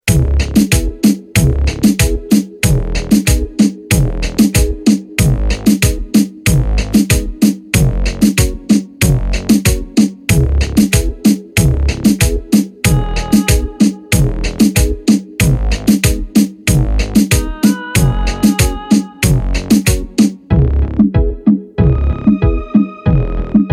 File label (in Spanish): amanece instrumental